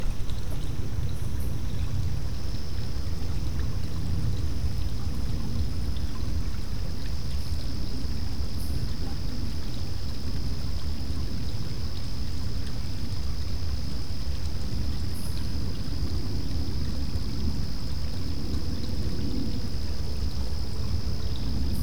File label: night stream, water; environment; sasquatch